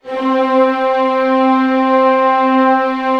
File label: ORC C3 FF, Orchestra; Sound On Sound - Peter Siedleczek's Orchestra